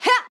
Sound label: Swipe Normal; Jackie